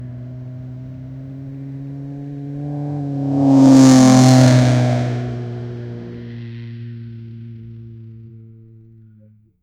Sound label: loud lamborghini flyby, sportscar